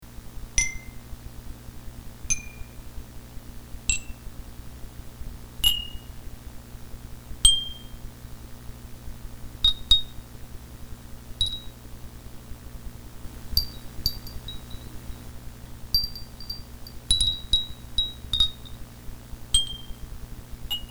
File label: scale, wind bell